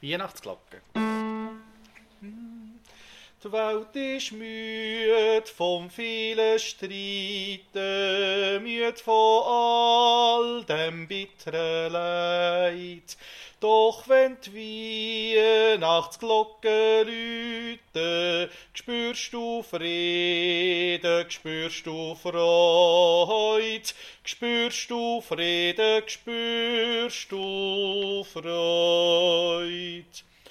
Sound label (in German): Bass